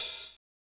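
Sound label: hi-hat open; Giga Samples Collection; EMUSP12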